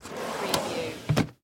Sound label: Drawer Open Wood; Sound Effects Bible